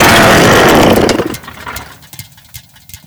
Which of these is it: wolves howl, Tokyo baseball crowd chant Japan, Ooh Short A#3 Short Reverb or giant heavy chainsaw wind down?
giant heavy chainsaw wind down